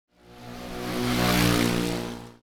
Scooter driving passing by

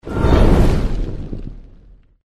Fireball cast hit

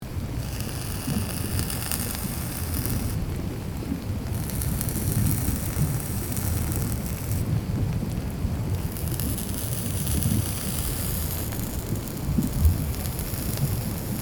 Volcano fumarole ambience steam hiss; nature

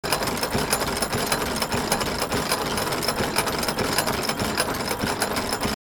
Machine room ambience machinery movement loop

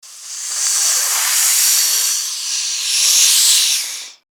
Snake aggressive hiss

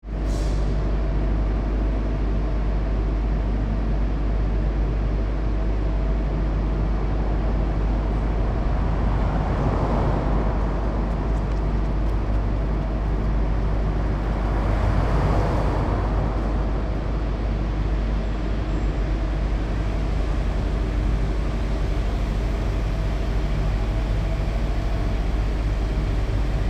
Shopping center indoor parking lot ambience

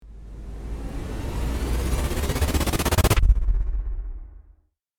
Cinematic whoosh glitch and cut

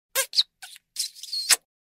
Kissing with juicy shrieking smooch

